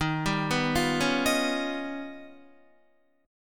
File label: D sharp Augmented 9th D sharp Guitar; arpeggiated; 10,10,11,10,13,11; JGuitar